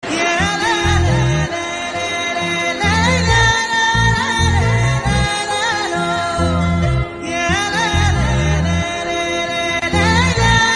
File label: song bgm; ram movie